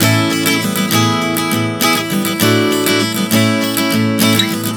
Progression 100 A-E-D-A, Acoustic Guitar, Chord Progressions 100 bpm